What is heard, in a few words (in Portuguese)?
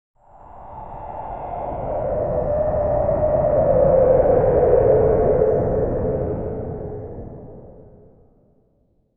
paisagens sonoras sombria, drone